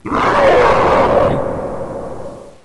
zombie pre idle; left4dead2

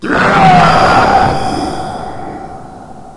zombie pressure; left4dead2

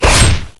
zombie wall; left4dead2

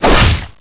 zombie wall; left4dead2

